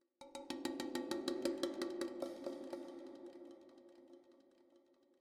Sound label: Spring Drums; Frozen Flame; windows